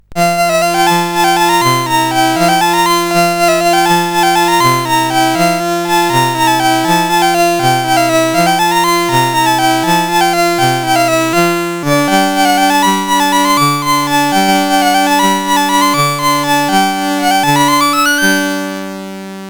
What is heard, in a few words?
Allegro, CSG8580R5